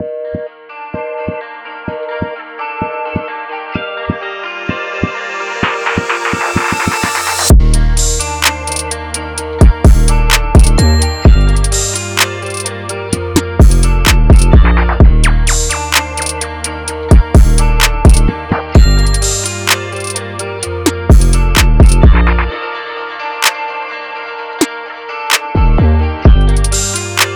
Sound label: Drum Kit; JH Platinum